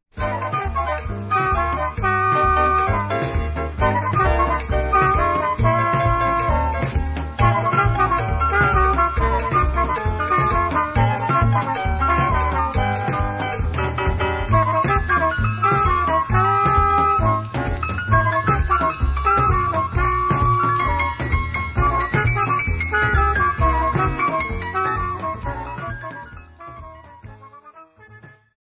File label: Merengue, instrumental